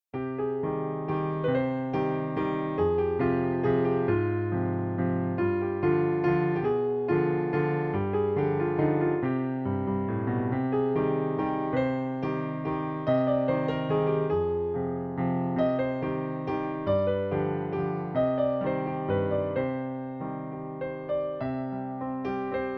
Waltz

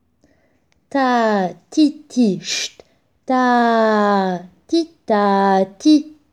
Vocal

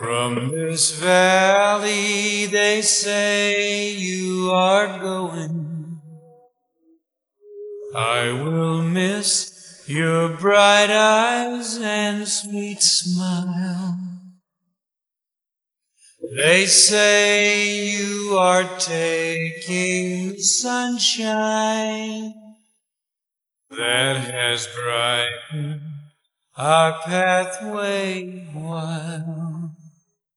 vocals

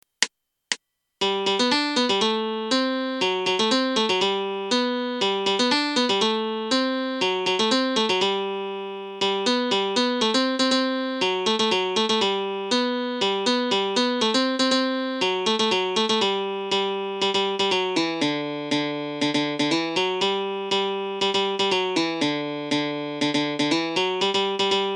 samba

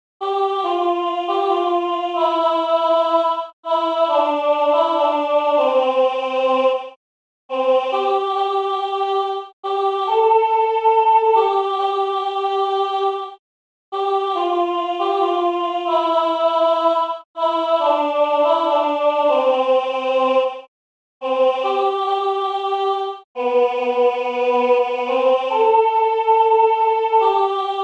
AVE MARIA GLORIOUS chant seul

